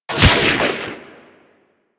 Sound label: Microwave Pistol Shot; Grineer